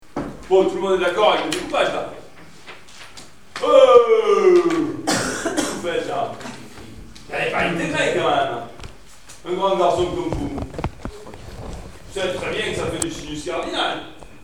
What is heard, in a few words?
oooooh